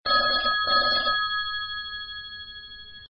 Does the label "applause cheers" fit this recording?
No